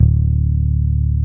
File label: Basses, Roland